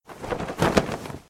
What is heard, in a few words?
clothing flap; Crash the Bash - Drink Break